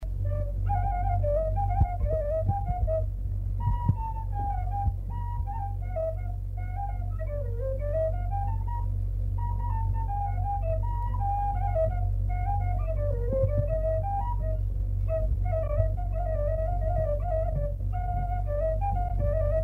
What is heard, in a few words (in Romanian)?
Ceardasul la fluier; jocuri si cantece batranesti; Vaslui